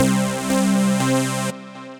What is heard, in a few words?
Magna Rhythm, Synth Rhythmic; Ableton; Factory Packs; Synth Essentials